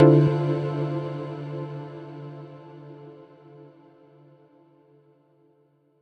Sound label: menu hit